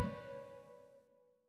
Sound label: Loop Tail; Good Job